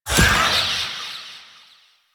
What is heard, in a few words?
Wraith Throw Loop End; Enemies; Warframe; Zariman